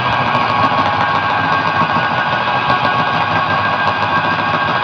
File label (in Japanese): kikai; 機械音２（低）; マシン 粉砕機 工場 ループにも